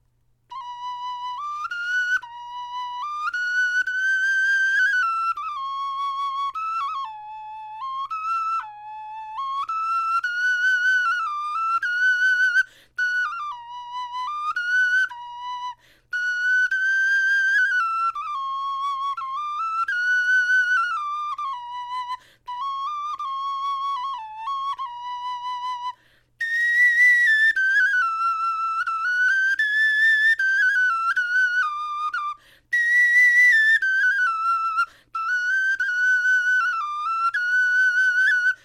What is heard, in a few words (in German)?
melodie, knochenfloete